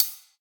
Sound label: dry pedal hi hat sample c sharp key